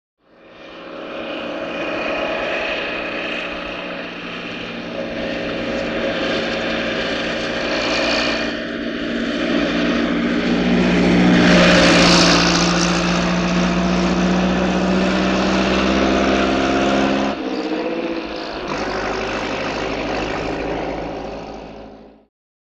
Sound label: By Vintage Bentley Up